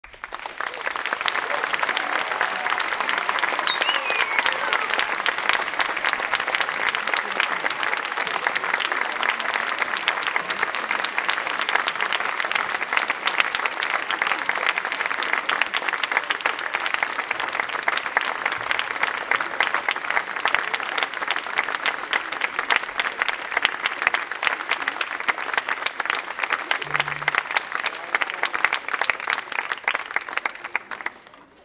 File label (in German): Orchester Finale Applaus